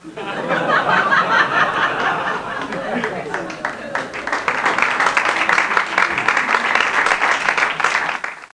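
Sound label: door close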